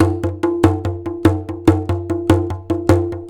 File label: TABLA, Indian Traditions; USB Soundscan vol.45 - Indian Traditions; AKAI